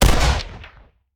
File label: Rifle; Sound Ideas; Guns